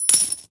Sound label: coins dropped; Mount&Blade Warband